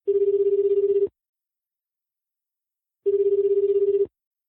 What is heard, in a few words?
Tone Early Media Japan